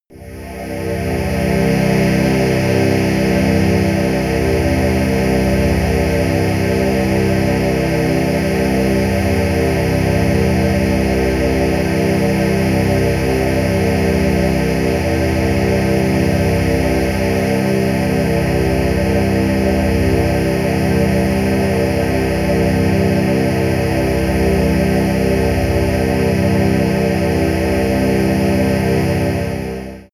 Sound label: piped air, music elements